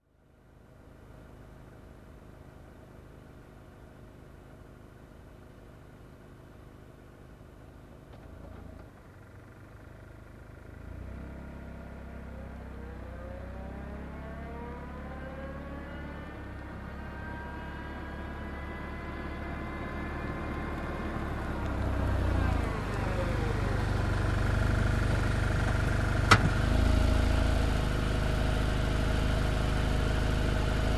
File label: Car reversing and stopping nearby